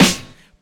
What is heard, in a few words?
sizzly snare drum sound F sharp key, Royality free steel snare drum sample tuned to the F# note